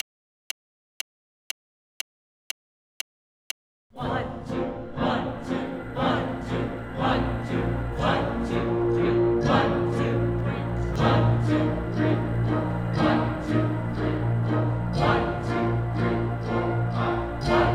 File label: musicians plus drone only